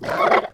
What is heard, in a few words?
Spider Soldier Startle